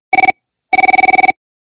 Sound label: ring